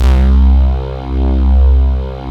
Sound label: sigh C2; Big Fish Audio; Synth City; COMPOSITE